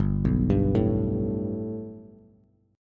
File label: G Major Add 9th Bass, chord; Gadd9 arpeggiated; JGuitar